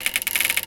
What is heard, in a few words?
window; inferno